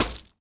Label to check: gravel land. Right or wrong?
Right